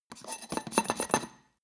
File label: clean metal rub short